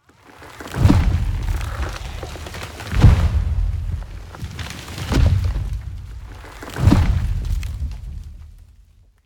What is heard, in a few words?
Dino Walking; Angry Birds